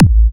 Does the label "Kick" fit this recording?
Yes